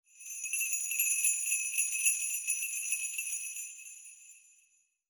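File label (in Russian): колокольчики россыпь звона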